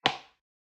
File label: footfall heel; soundscrate